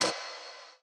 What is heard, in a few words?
threshold stone